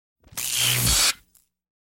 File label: The Sound Of Electricity